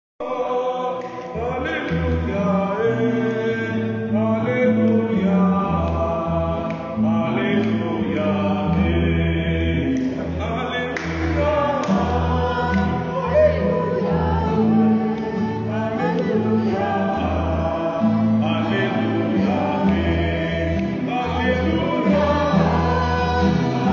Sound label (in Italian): alleluia soprani